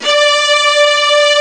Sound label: Violas D3